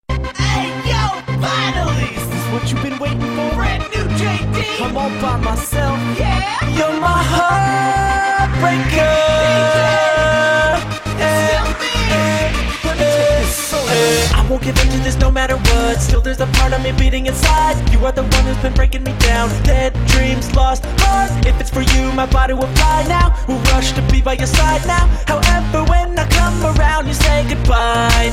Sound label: Practice Song